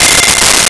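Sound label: Mute Trumpet F5; Nintendo; July 2020 Gigaleak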